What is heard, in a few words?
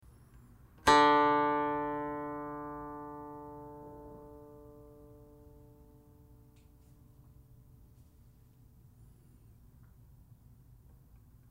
guqin; chuYinKouXian